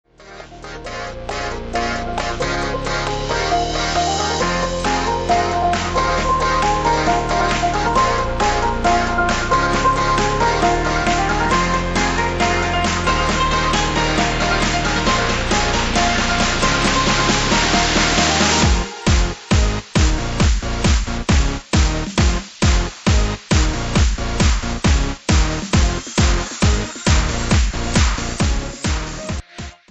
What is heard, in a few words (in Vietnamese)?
Nhạc game; asphalt6